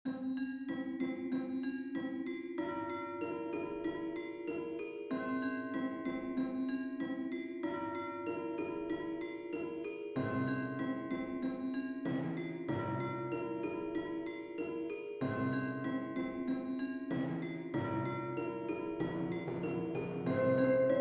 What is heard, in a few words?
Haunted Bell Tower